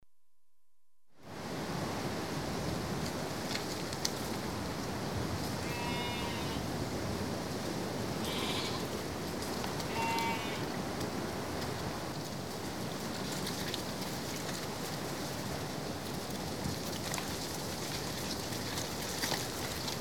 Flock of sheep run up lane, lots of wind in trees, gate opening; Livestock; BBC Sound Effects Library